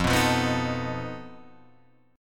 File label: F 7th Flat 5th F down